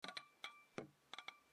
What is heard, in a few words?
dinner